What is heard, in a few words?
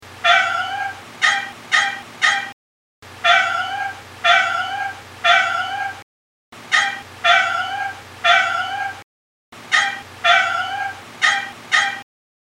Morse Meeps